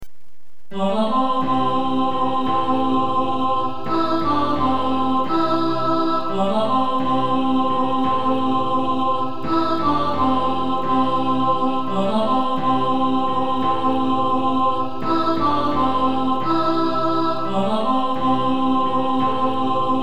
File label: alto, choir